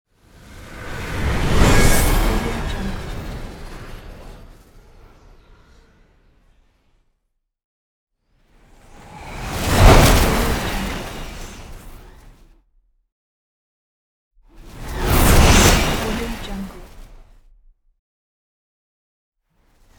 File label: spaceship